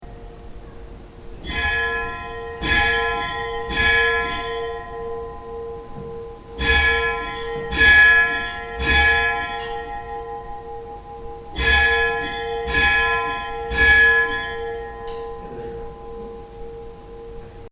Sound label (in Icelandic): reykholakirkja allar, kirkjuklukkur